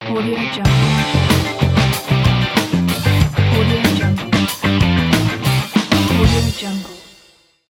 grand ident

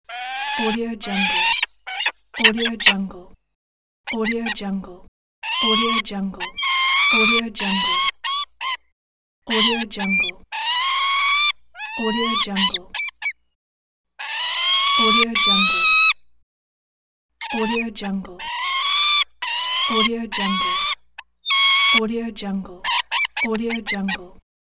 chick

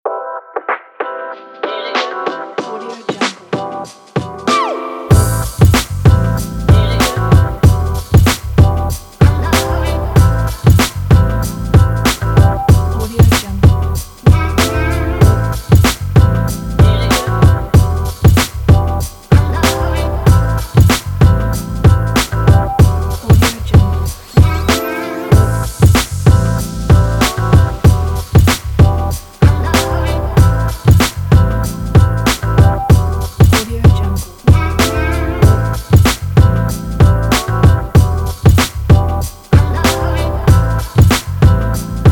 hiphop

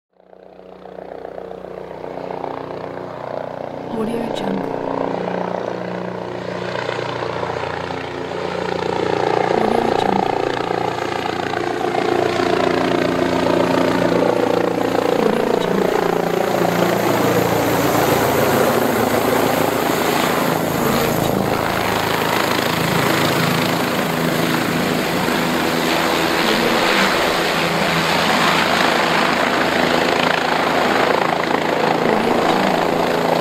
helicopters landing

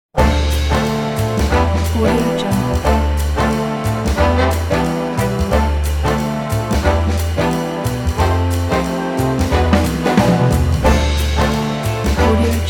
new york jazz

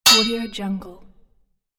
sword hit on helmet

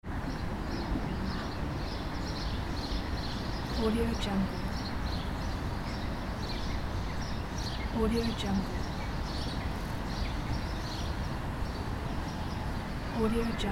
city park ambience

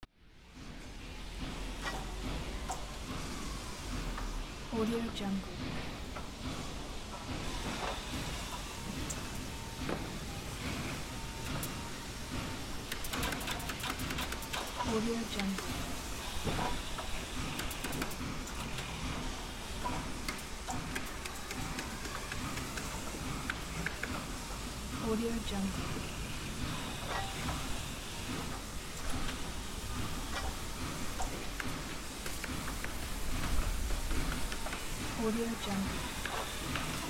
factory ambience

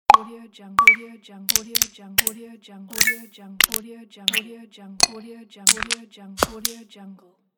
click

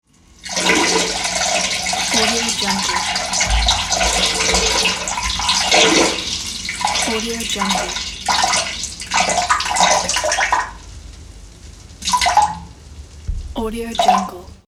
peeing in a toilet

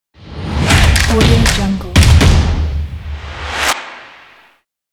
stomp logo

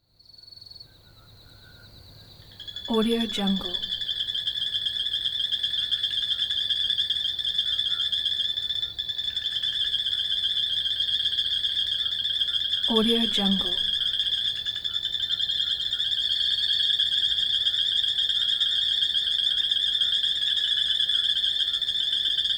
atmo bush water hole night bird stutter; smartsoundfx

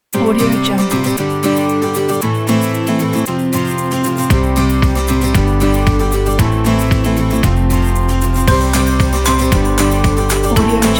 upbeat folk

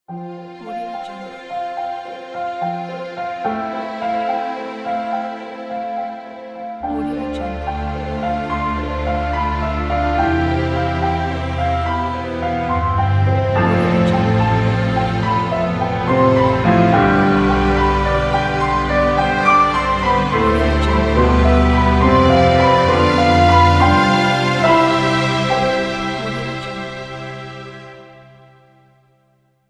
child charity foundation logo

